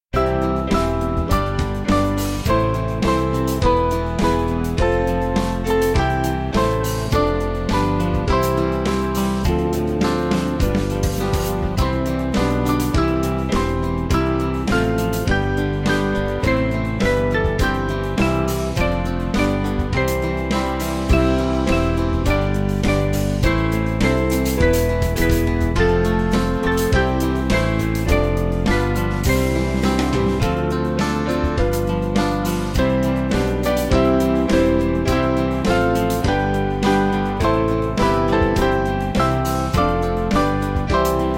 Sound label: God Is Love, Band; Unser Herrscher